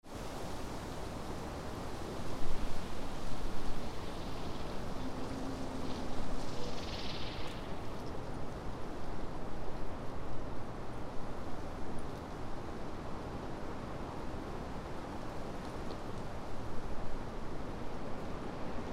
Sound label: wind turbin; 風車; 理工共通棟の屋上に設置された，理工学類のとある研究室で開発されたと思しき / 風車 / の音．風車騒音については，昨今，低周波音として問題となることが多いようであるが，この風車については通常の可聴音の帯域が問題だ．